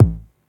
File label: tight kick one shot a key; kick drum samples